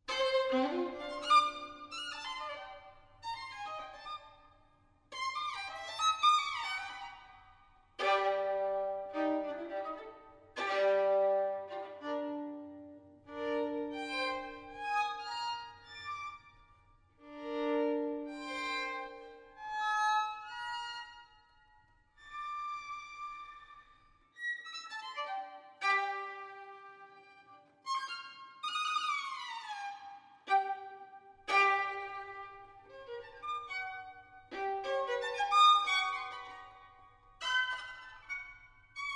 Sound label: String Quartet; Edward Cowie; 'Birdsong Bagatelles'; Skylark